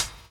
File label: DR. LOOP; X-Static Goldmine 3